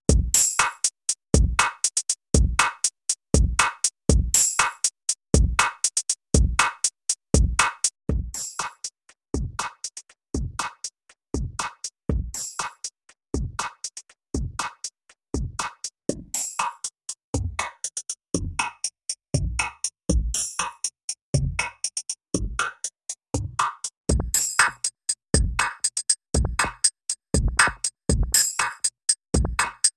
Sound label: 12 STAGE PHASER Drumloop; CHERRY AUDIO RACKMODE